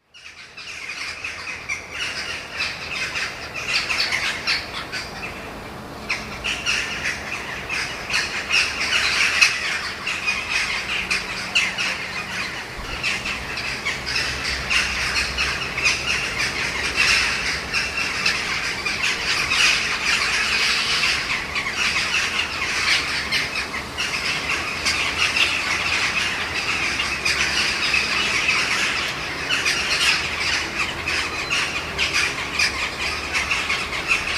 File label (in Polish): kawki; Stado kawek; Kawka zwyczajna; krukowatych (Corvidae); ptaki; kawka jest średnim ptakiem synantropijnym z rodziny krukowatych (Corvidae